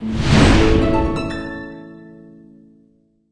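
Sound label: Sound Effect Break Out New Episode Open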